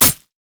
shen basicattack; LoL

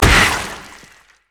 basic attack oh; LoL; vladimir

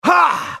effort; Ezreal

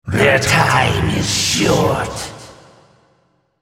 attack; Malzahar

